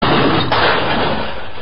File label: crash